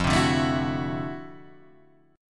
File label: D 9th F down Guitar Standard; strummed; JGuitar